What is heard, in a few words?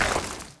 coal stop; Tanks